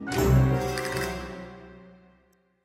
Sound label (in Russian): Поражение